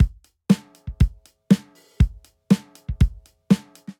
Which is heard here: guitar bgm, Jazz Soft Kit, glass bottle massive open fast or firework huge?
Jazz Soft Kit